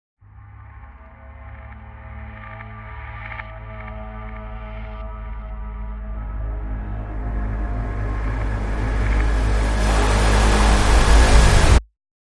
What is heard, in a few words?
Crime Sting, No Strings